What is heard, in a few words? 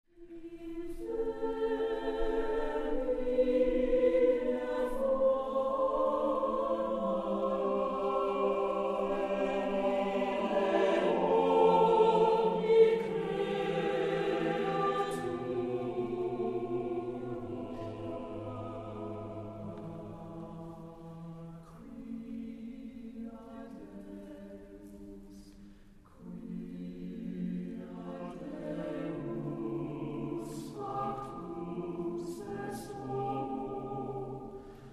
Antiphon